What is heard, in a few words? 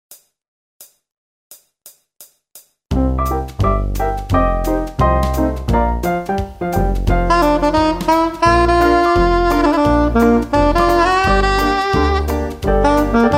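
Jazz melody; MyVirtualBand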